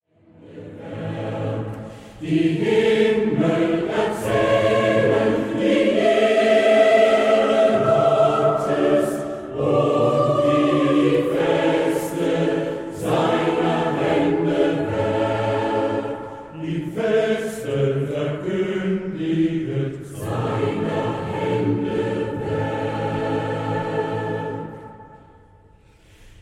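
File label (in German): die Himmel erzählen die Ehre Gottes, Chor; die ehre gottes unvergessen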